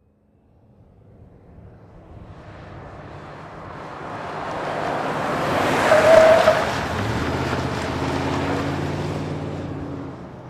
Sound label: Expedition Fast Corn, ford expedition